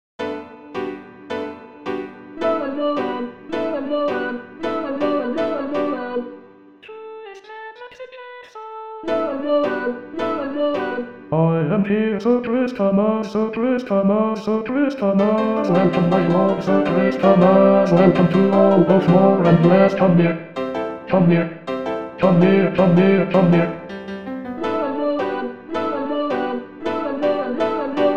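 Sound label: Bass; Sir Christemas; Xmas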